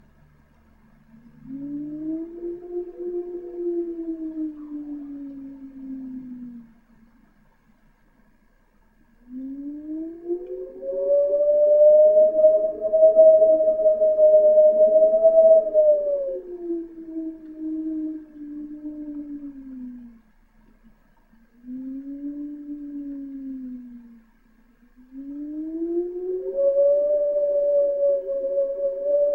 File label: wind; AssaultCube